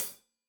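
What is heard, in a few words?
hat closed, hi-hat cymbals stereo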